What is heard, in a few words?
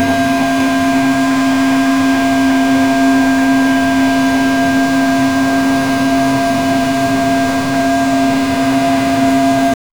continuous industrial com, machine